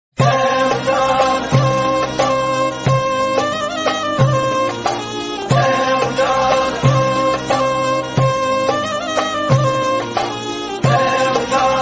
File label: movie bgm ringtone bgm instrumental ringtone; charlie